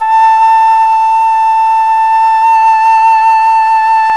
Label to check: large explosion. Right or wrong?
Wrong